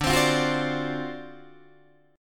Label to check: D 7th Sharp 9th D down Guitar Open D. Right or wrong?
Right